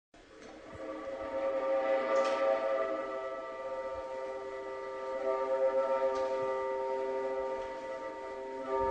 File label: sigudok